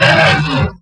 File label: mutant pain